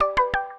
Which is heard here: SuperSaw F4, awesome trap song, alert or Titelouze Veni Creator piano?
alert